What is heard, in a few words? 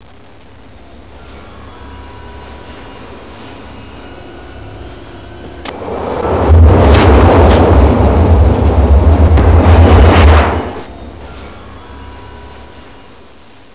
TRAIN; subway